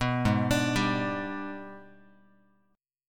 G sharp Minor Major 7th Flat 5th, Guitar; arpeggiated; JGuitar

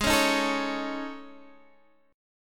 A Major 7th Flat 5th G sharp

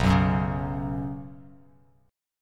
B Suspended 2nd B Bass Standard, chord; strummed; JGuitar

